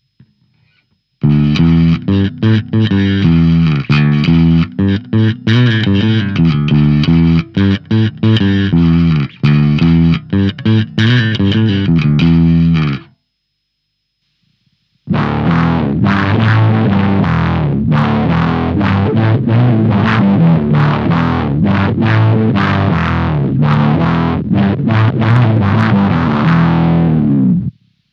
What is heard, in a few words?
Octafilter FUZZ